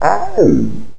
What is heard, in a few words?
pain